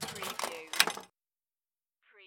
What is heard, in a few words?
Pinball sound, ball fed into launch slot